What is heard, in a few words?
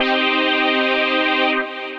Stab, Synth Pad; Gloom; Ableton; Factory Packs; Build and Drop